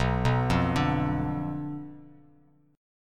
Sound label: B Diminished B down Bass Standard